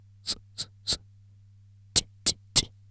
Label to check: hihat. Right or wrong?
Right